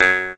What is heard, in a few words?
incorrect; curiousgeorge